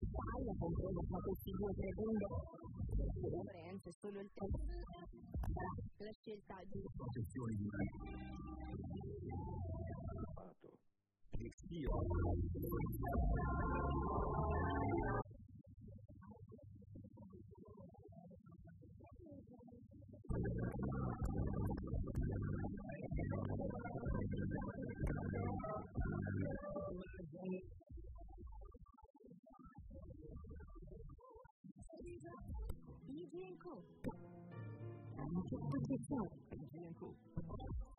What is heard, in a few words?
zapping; Zapping (loop); Karpet